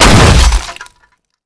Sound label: jeep crash hard